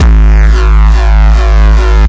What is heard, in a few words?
desecrated bass hit, evil bass sounds